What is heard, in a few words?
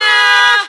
"blue raspberry", Voice